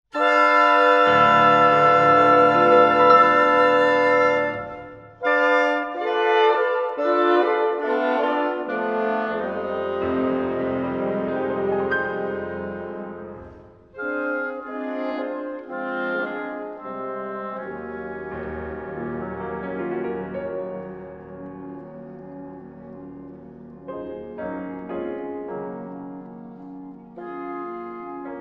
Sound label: antiphon; amorous